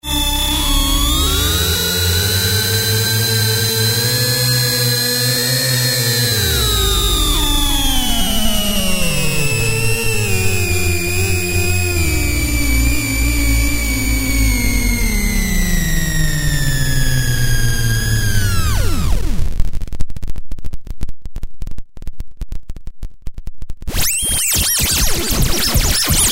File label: Synth Bomb SB-1 delay